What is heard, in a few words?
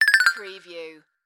Digital Chime Alert Time Left